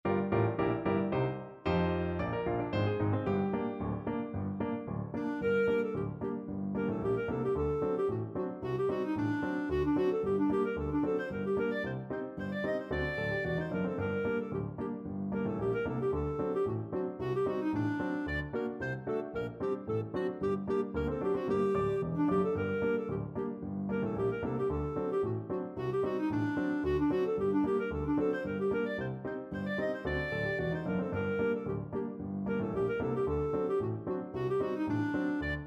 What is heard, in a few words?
clarinet; Sadegurer Khosid